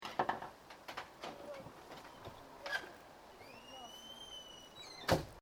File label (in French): porte